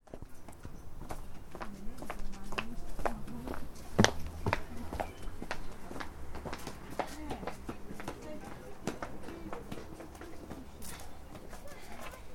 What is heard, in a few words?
footsteps on Leigh Hill